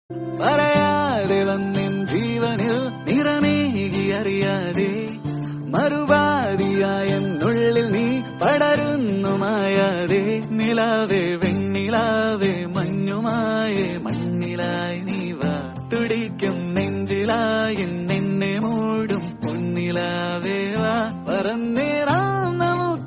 tamil, love, song; bro daddy

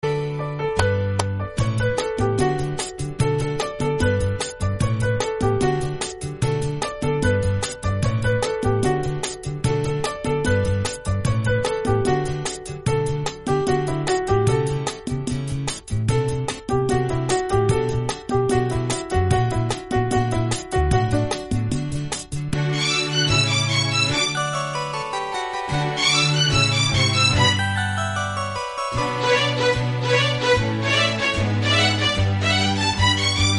guitar bgm

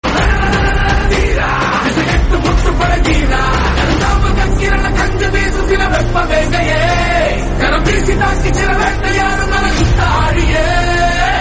love tamil; kgf 2

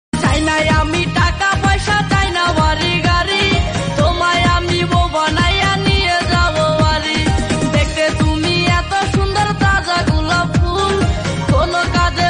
bangla movie song

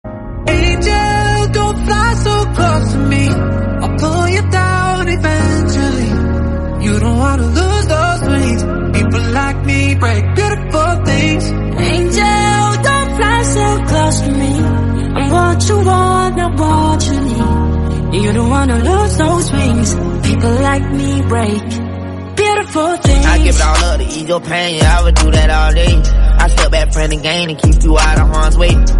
english song; fast x

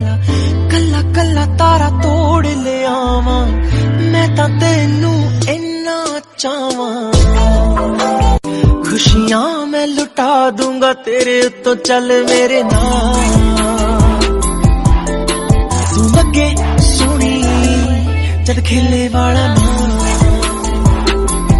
punjabi, love

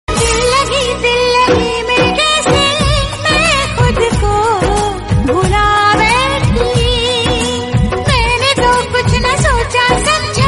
female

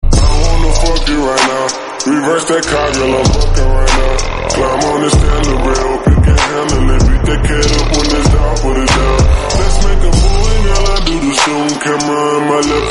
slowed english song

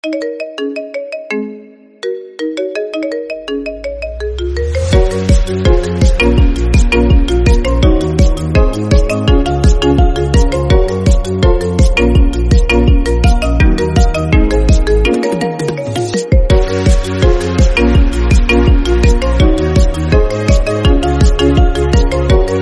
iphone remix

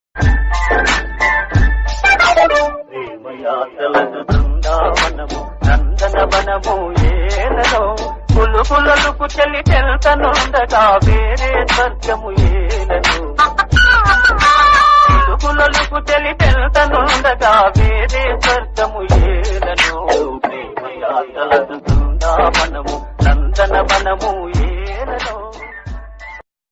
Telugu old songs

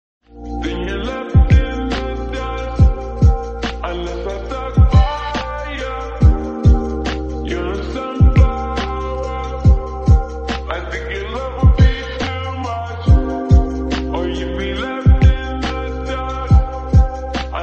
lofi version

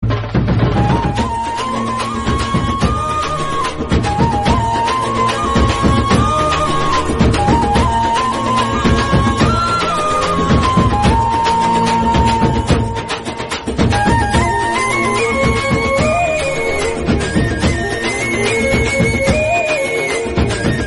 tamil south indian love bgm instrumental

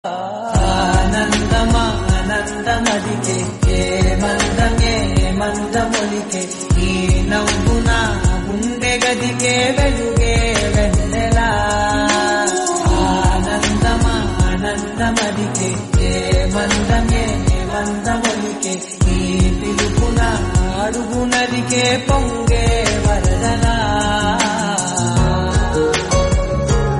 telugu song; ishq

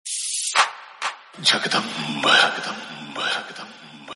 message tone

